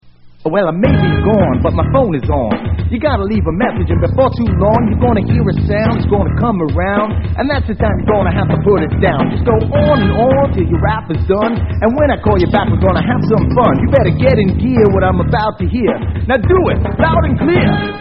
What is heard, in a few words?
Rap; MusicalMadness